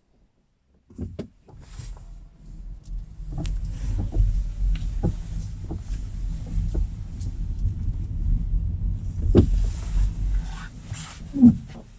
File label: Kia Niro